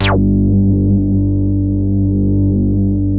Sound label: TOUCH HI G2, OBMX BASSES